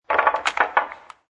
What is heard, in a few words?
Billiard Pool Snooker Put Balls In Frame; Pool balls, snooker balls, billiards balls; Pool Sound Effects; Sports